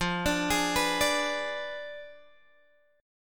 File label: F Diminished 7th, Guitar, Drop D